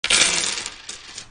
emptying the piggy bank

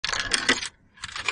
Inserting Coin Into Machine

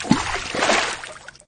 water splash; CrossyRoad

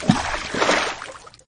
water splash low; CrossyRoad

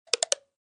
click ball

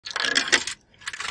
Inserting Coin Into Machine

